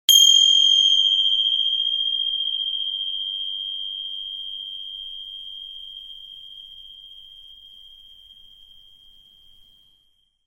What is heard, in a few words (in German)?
Zimbeln